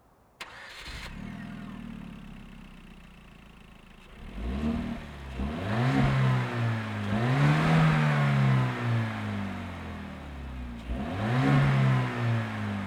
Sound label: Hyundai Genesis Coupe 2.0T